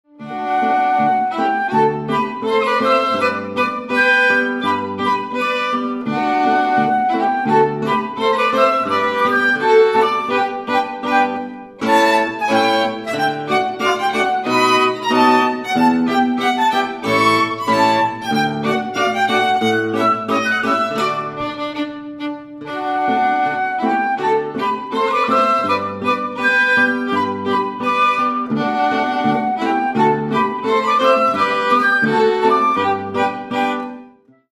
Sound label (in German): Walzer Schottisch